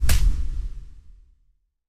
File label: Shadow Punch; SNKRX